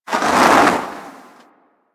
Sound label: Eruption Fire Extinguish; Sargas Ruk